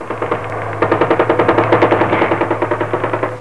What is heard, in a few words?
SAT JET